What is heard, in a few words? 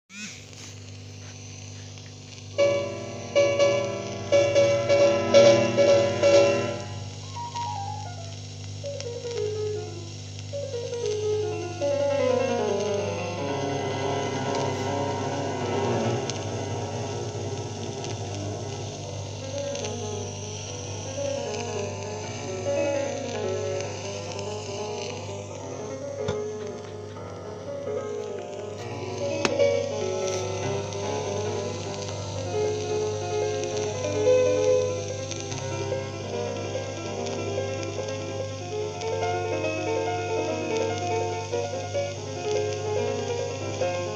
piano; 热情奏鸣曲; 据说是列宁喜欢的曲子